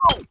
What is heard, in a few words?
Close, UI